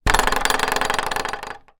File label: household door internal bang wobble metal lock rattle